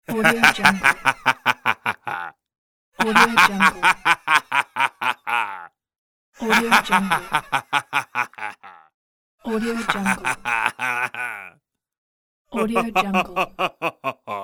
male sinister laugh pack

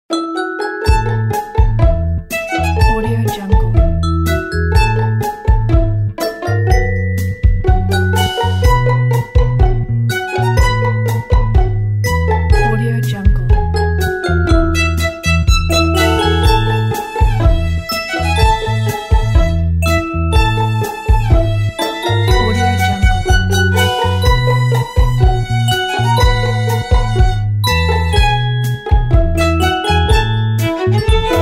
charming cartoons

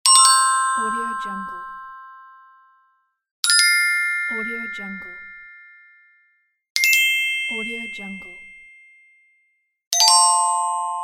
twinkle

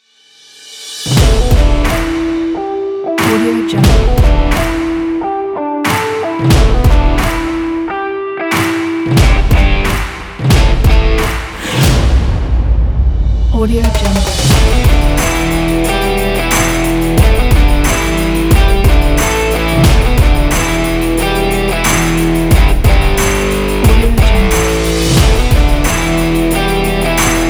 rock move

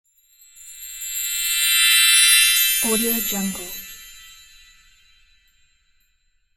shiny transition with chimes

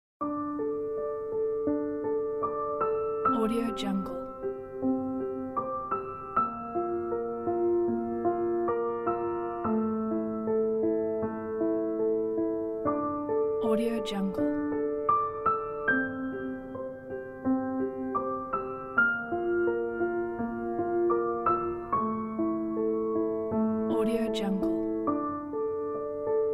sad memories

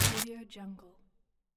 scifi short electric shock

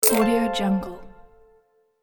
coin payment online shop notification

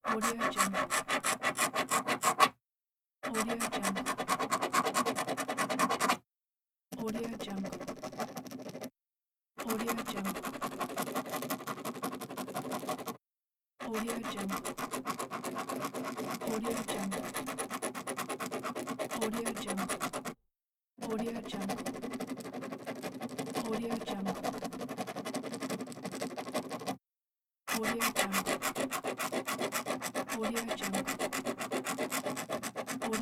ball pen writing

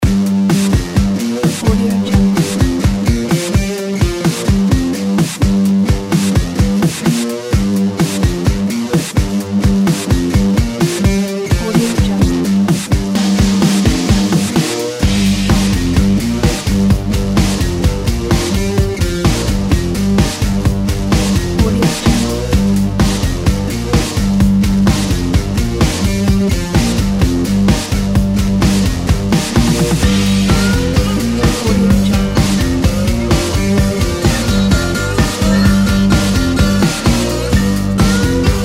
super rock star; desus

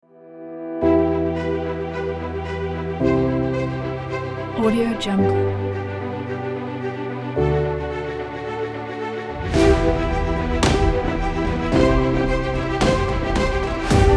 epic hero hybrid trailer

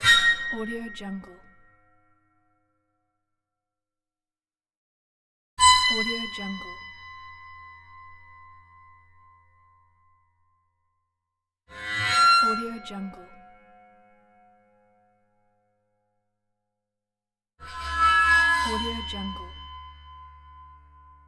metal rubbing squeaks fast

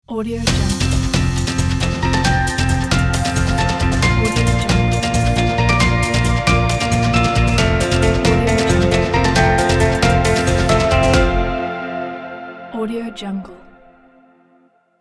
inspiring news opening logo

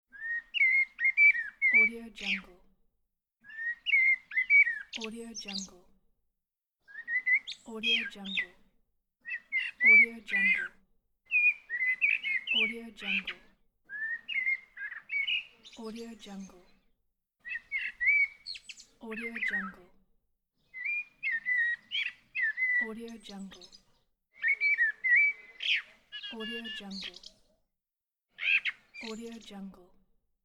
bird singing

